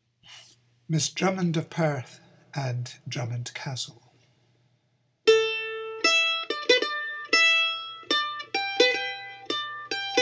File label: Drum